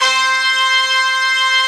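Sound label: Brass Ensemble, Roland; Club-50 - Foundations Roland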